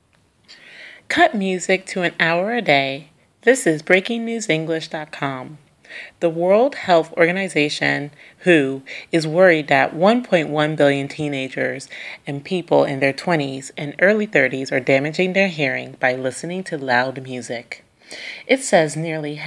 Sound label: loud music